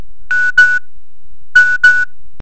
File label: nokia sms